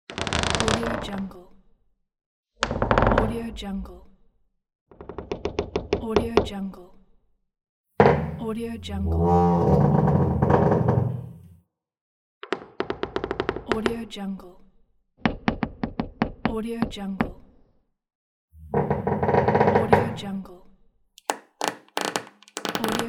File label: deep wood creaking